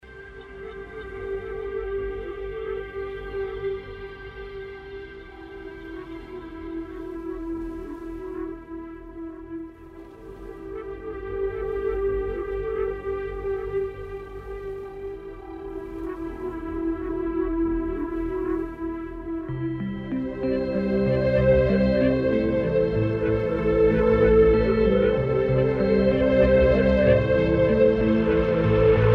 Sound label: Ballroom Rumba